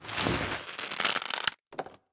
bow pull

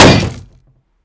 shield light block big

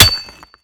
pickaxe use

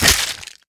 bite medium, creature

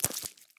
spider; animal

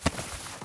cloth up

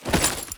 armor up

